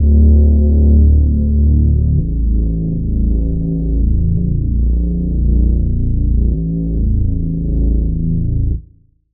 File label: Reese; Routed Sound; Sylenth1 Expansion - Noble Gangster Vol.1